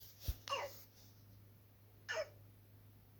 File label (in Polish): Szczekajacy kaszel